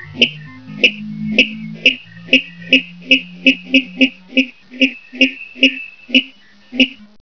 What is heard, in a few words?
rallus aquaticus